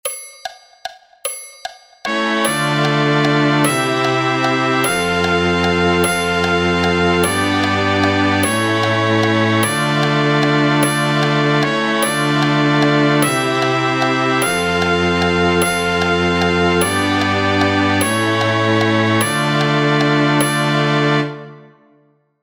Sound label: tarantella c sharp intro perc; Tarantella Accompaniment and Guidelines